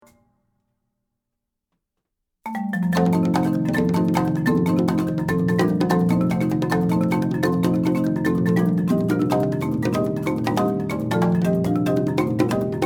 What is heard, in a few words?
sunflower percussion; tribeca